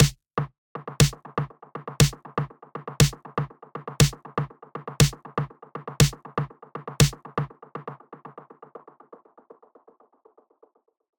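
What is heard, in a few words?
Snare filtered, SNARE HITS; feedback echo plugin; with more filtering; Preset: Don't Call Me Basic with more filtering; Newfangled Audio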